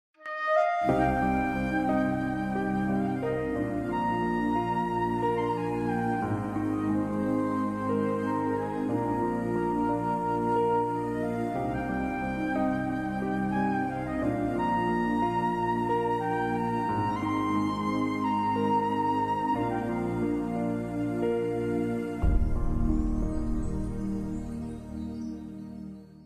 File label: Theme Mobile Ringtone